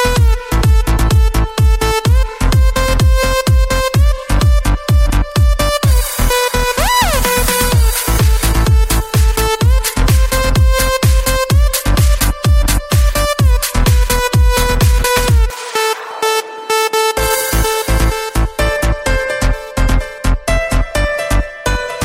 Samsung S9 Plus Ringtone

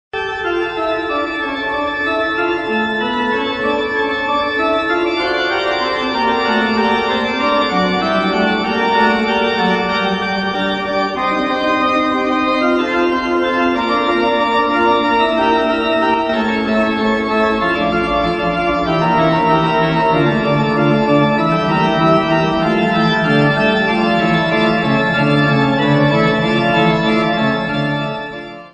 Classical flute Ringtone; MUSICA CLASICA

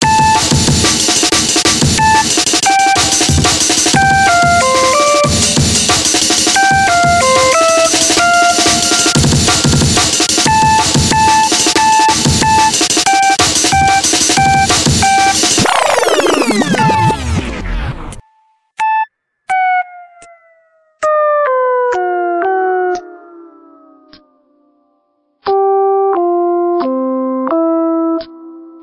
Samsung Note 7 Ringtone; tonos para teléfonos móviles SAMSUNG

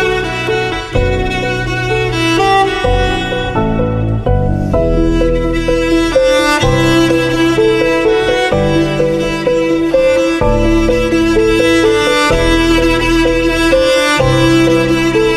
Classical Ringtone; MUSICA CLASICA

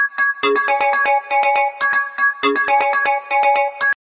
samsung galaxy alarm Ringtone; tonos para teléfonos móviles SAMSUNG

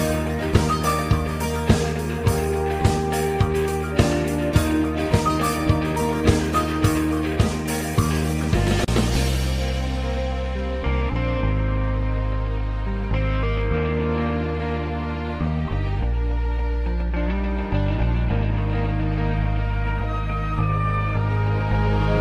Samsung mobile ringtone

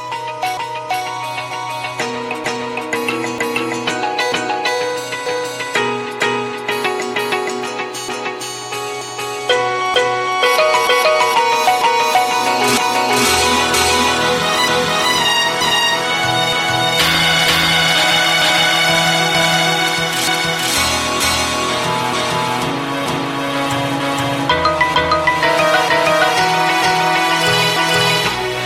Samsung M40 Ringtone; tonos para teléfonos móviles SAMSUNG

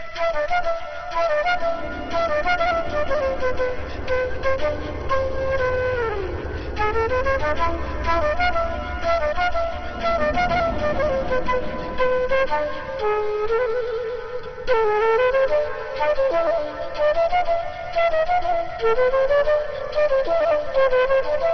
Classical flute Ringtone; MUSICA CLASICA; tono de llamada; ikariotiotikos

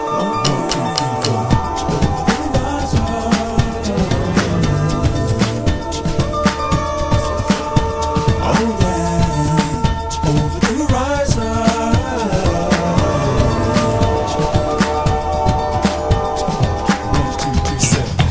Samsung ringtone

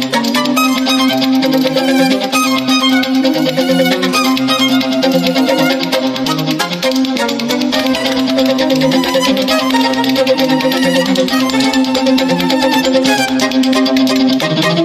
Classical Ringtone; MUSICA CLASICA

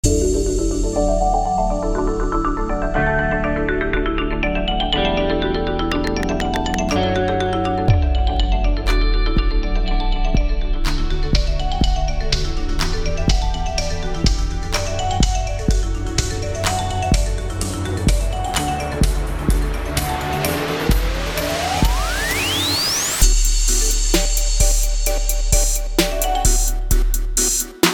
Samsung S10 plus Ringtone

